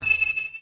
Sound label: bing